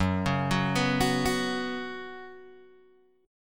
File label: F sharp Suspended 4th, Guitar, Open G